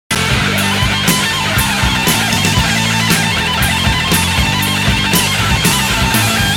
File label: guitar solo